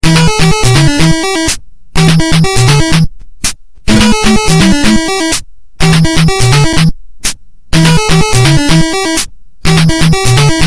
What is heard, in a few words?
Nes Tone; Dragonball